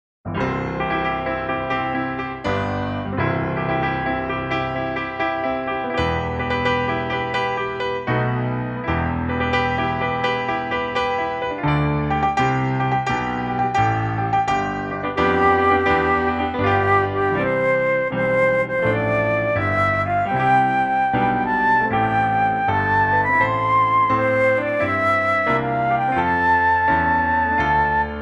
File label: piano solo